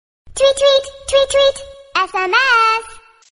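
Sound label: tweet tweet sms notification baby voice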